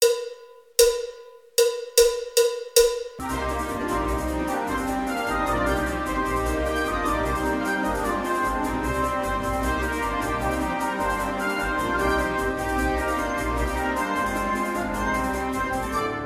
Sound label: Spanish Dance